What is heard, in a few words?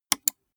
gaming mouse left click single